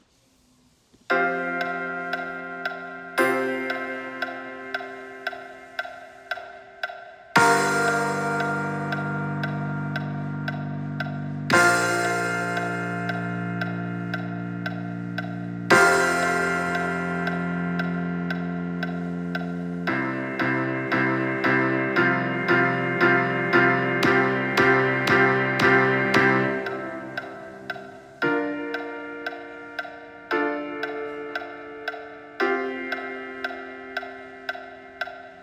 Instrumental

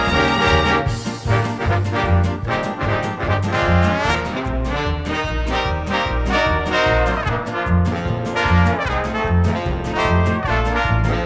Instrumental

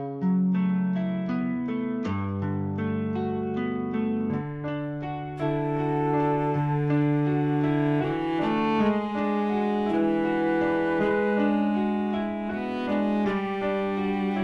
Audition Cut Instrumental

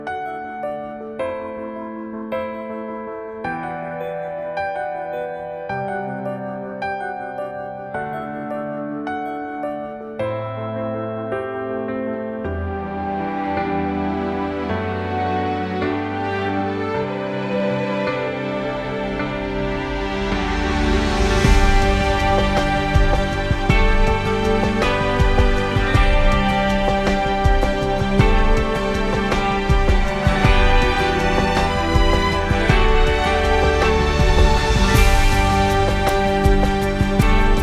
Audition Cut Instrumental; descendants

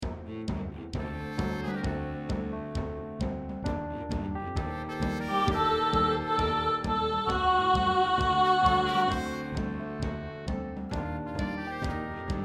Alto

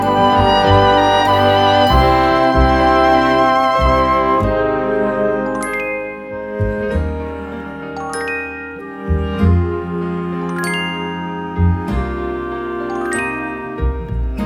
Audition Cut Instrumental

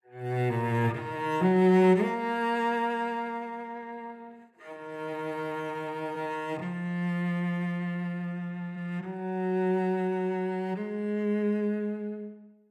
Stay I Pray You Audition Cut Instrumental

